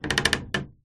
household wooden door old large heavy basement creak